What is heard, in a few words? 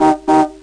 hup; tumbler street